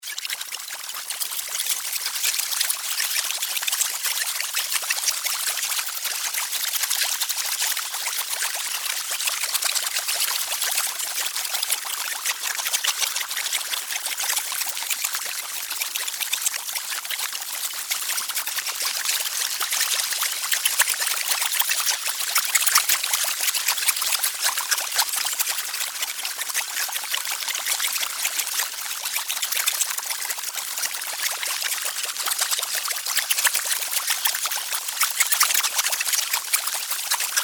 Beaker Boil Lab, Scifi, Laboratory; Scientist, Potion, Ambience, Science, Fiction; Tech; BlastWave

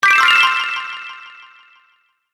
Win Bonus With Delay, video game sound effects

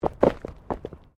Body movement Impact Roll Road; Body movement foley to simulate falls and deaths; Rolling

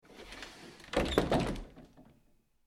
Door Closet Close; Foley; Closet Doors

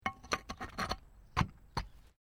Stone Small Move

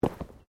Body movement Clothing Foley

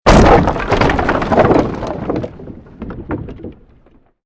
created wooden structure crash medium; Series of dramatic events based on wooden smashes and collapses; Crash Sound Effects

